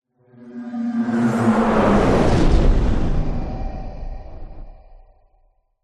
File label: science fiction pass by whoosh into explosion; zapsplat